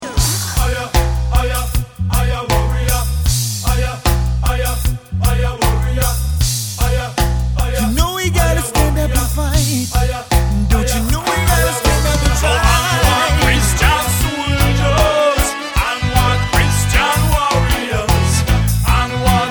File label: onward christian soldiers reggae gospel